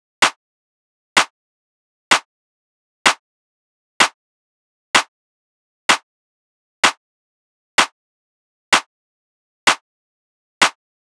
Drum Loop - 127 BPM Clap, house; Loop Stems; Cymatics; GHOST (Beta Pack); All There Drum Loop

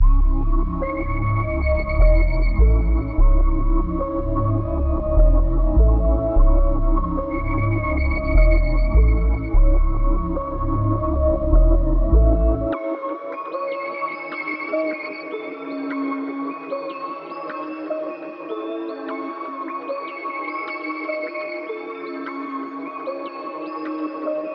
151 BPM C# Min, Melody; Cymatics; 2022 Melody Collection Platinum Expansion

